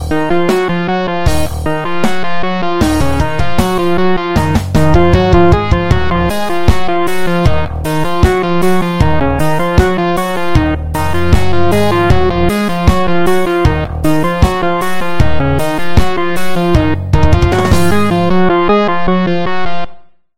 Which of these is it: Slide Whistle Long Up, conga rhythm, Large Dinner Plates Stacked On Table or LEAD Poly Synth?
LEAD Poly Synth